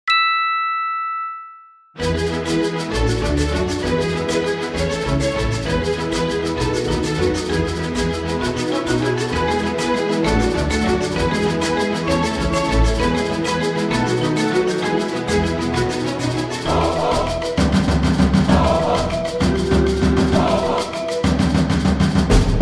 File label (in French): ruban musique